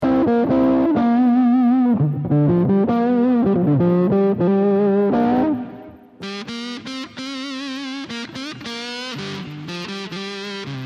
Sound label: tonebend